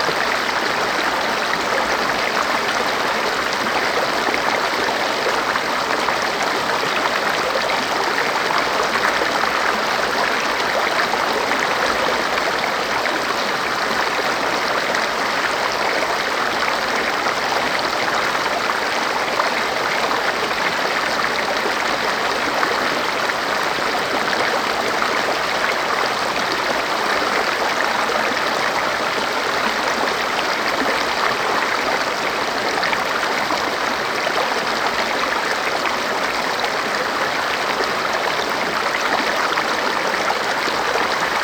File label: SMALL RIVER; Inquisitor 3